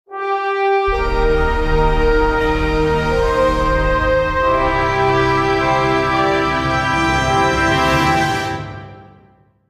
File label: Win Battle - Dungeon; heroes of might magic v gamerip 2006